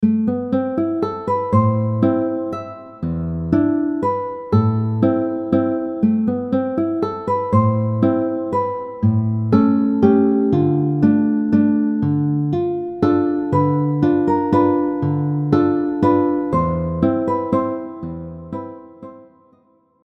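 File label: Maria Luisa, guitar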